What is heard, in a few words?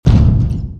step; valkyrie npc pack; fallenlogic; rancor